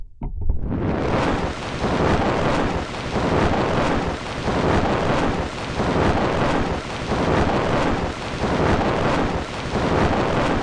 end of the record; Sound FX Real Life

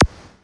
Q HiFi TD, Roland TD 7; DRUM MACHINES

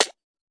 tight hit, Tight n Hard Kit; STYLE KITS (Hip Hop, Etc)

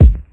classic hip hop kick; Classic Hip Hop Mega Kit

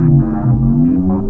reso powerdown; Weird and Interesting Hits; single hits

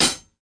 hihat, Pearl Real Kit; REAL LIVE KITS

